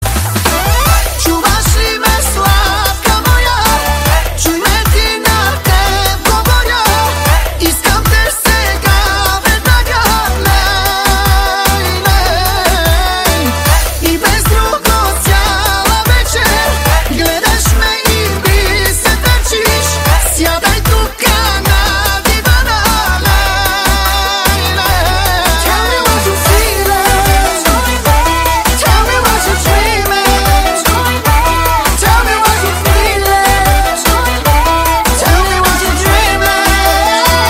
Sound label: music